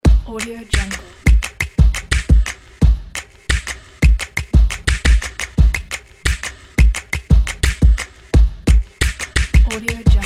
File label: energetic stomp percussion